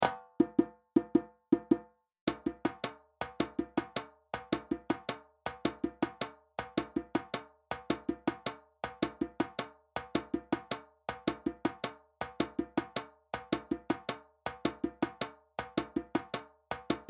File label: Djembe